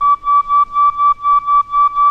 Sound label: regular bleeps